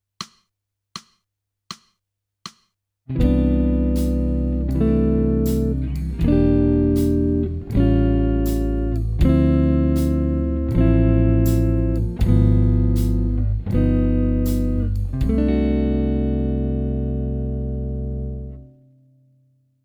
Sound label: jazz